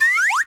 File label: apricot jump bounce jump